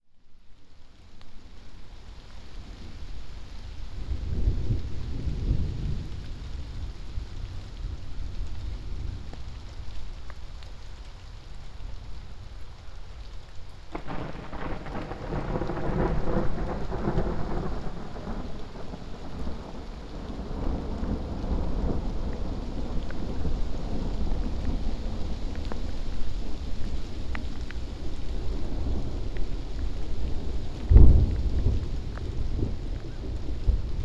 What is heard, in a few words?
Thunder; Cobourg